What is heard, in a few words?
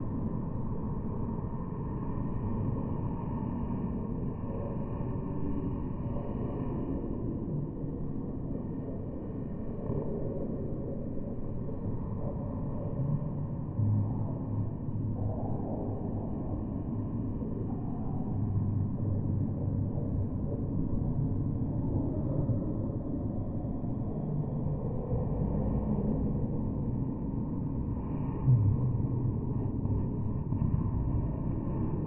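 ambience luncheon gathering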